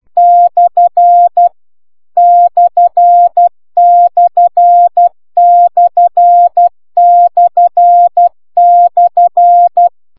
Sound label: cw